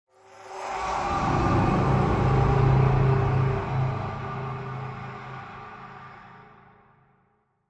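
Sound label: Hit Horror Cold Blooded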